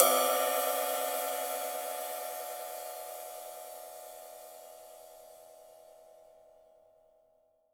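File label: cymbal, Real Drum Kit, swing kit; Best Service ProSamples vol.43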